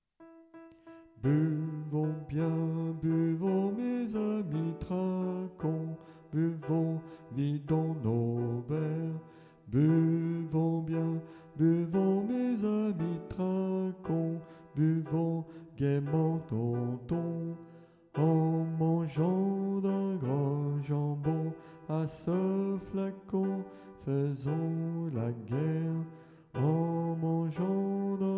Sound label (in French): bas; le vin clairet ( basse)